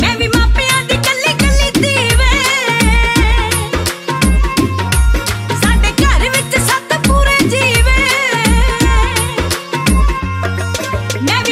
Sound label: Song Female Punjabi Ringtone